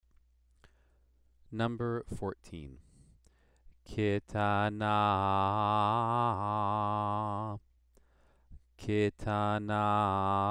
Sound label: Torah trope exercise